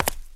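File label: girl in heels walking past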